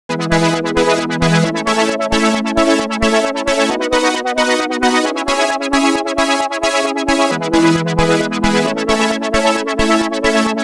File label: parallel lpf hpf; e-autofilter300